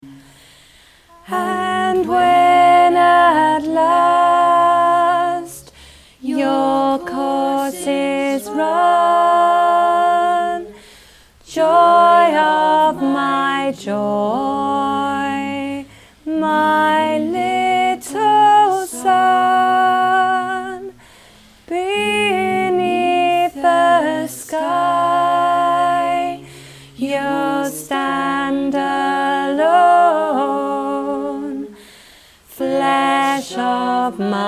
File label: Lullaby harmony last section with tune; Wexford